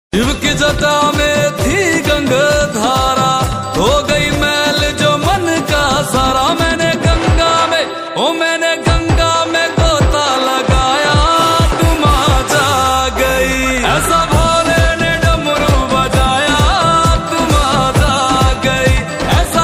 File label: Shivratri Song